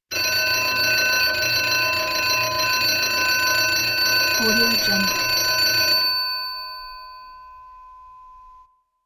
telephone bell ring; kipaloops

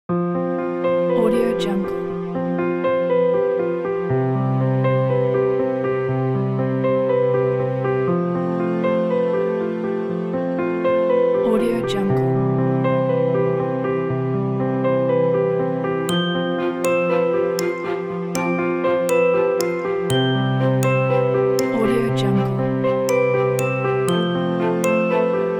sentimental soft cinematic

